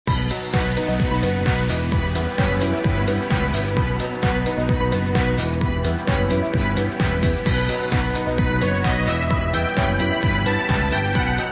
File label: Menu soundtrack; love live school idol festival; μs mode